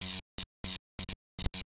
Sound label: Electric Particles; Caffiend; Going Under